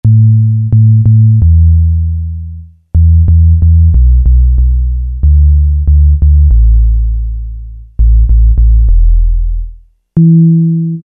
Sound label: Rap Basser, Alesis Z1 Hip Hop